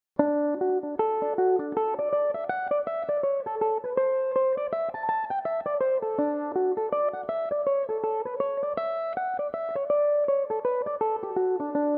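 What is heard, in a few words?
D major, Irish mandolin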